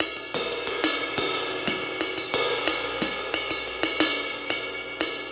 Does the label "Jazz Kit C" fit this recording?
Yes